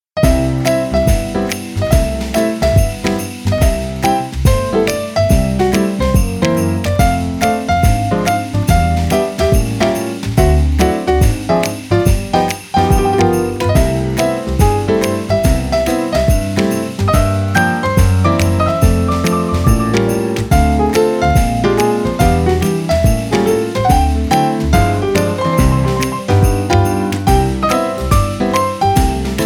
jingle bells music jazz christmas holiday background intro theme